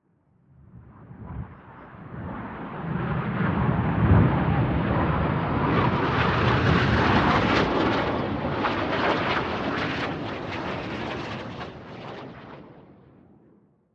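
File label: Plane Falcon 20 Overhead Jet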